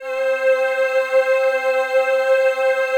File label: digi pad, Pads